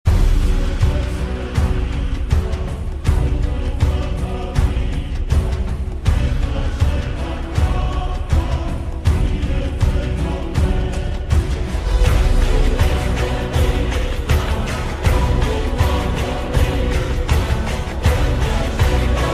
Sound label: Epic Ringtone